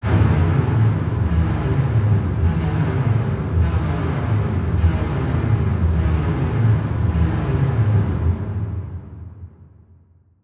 Adrenaline Strings Low, horror strings

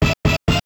hit jingles; kenney musicjingles

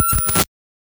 Signal Scramble; FREE SFX PACK VOL 3

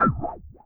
blip

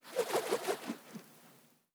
Twirl Smol, organic wooshes; Micro Pack

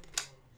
reload; weapons

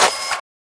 Shop Cash In; FREE SFX PACK VOL 3

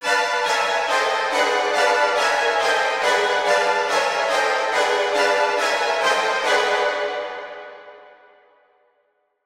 Intense Strings High, horror strings

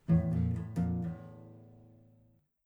string tune; abstract; Free Sound Assets

